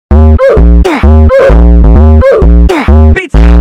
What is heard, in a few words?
oof phonk